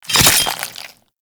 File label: Dagger Slice Flesh; Warframe; Dual Daggers; Hit Effects